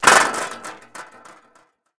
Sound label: wall metal; Golden Crayon Sounds